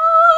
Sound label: SOPRANO E4